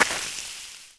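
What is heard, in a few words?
lava step; generic; ambient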